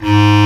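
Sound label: Bass Clar mf G#2; Digital Sound Factory Virtuoso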